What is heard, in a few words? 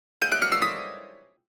Comedy Piano Chromatic Run Up Descent; Hollywood Edge; sound designer's toolkit v2